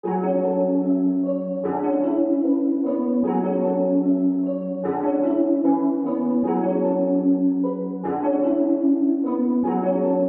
Fmin 150 BPM

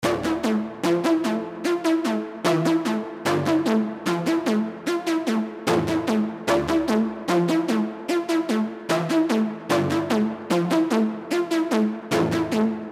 Serum Lead

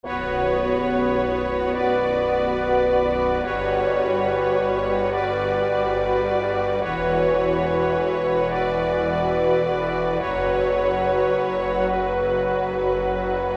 Drill Melody Loop Bmin 142 BPM Brass

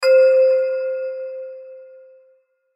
Bell One Shot

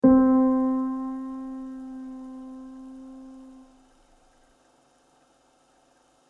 Keys One Shot

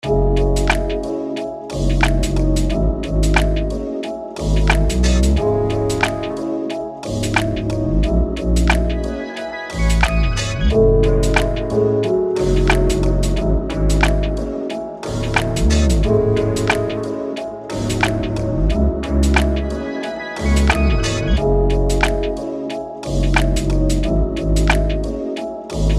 Lofi Melody Loop Cmin 90 BPM

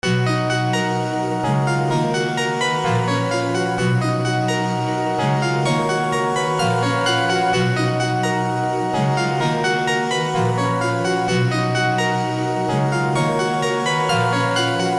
Serum Synth

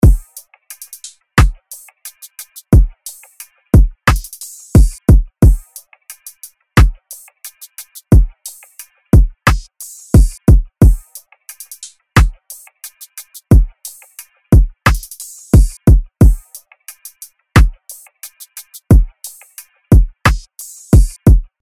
RB Drum Loop 89 BPM

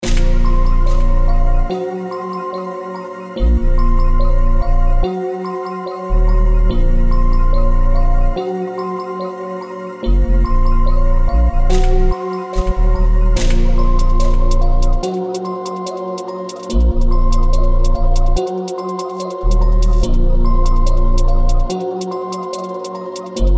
Drill Melody Loop Amin 144 BPM

